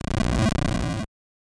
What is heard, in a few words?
Fireball; Bowser's; super mario bros. 3 1988 nes